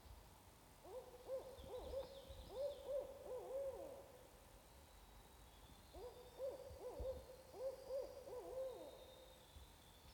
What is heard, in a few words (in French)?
Chouette rayee; Kathleen MacAulay